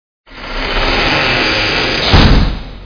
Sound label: Door Creaking with Slam